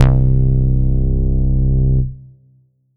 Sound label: Alesis Fusion Bass C3